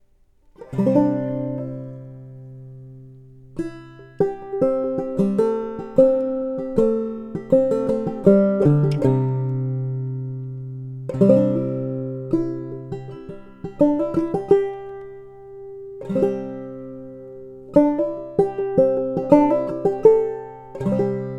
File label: banjo